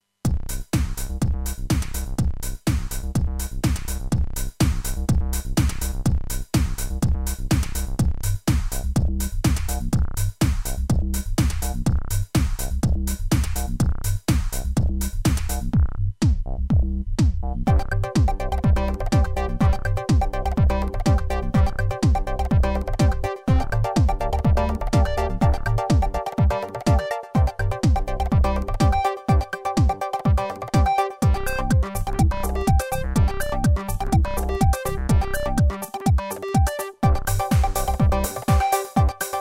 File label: electro club